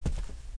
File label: player carpet; Group Mapping Project